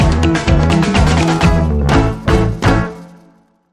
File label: Hit